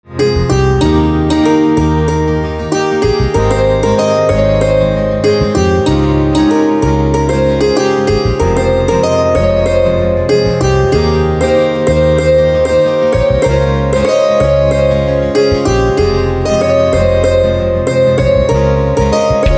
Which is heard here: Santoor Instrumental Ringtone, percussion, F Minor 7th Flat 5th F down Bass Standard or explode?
Santoor Instrumental Ringtone